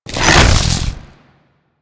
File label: Infested Ancients Melee Attack; Warframe